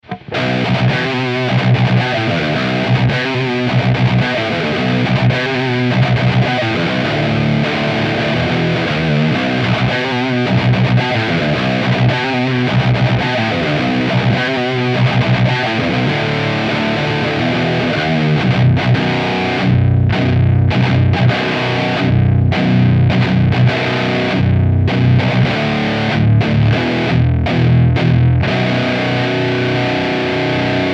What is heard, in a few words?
Lead; Canal lead, Fat 2, Depth 5, Presence 0; Evil Eddie; AMPLI